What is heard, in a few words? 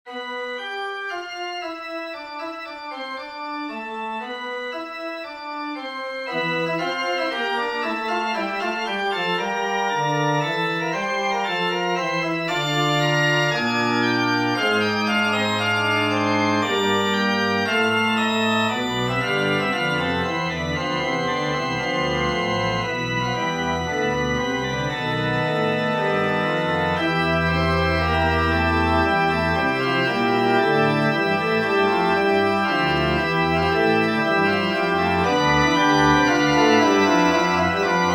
Locrian Fugue 31 edo